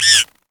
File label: ravens caw; odin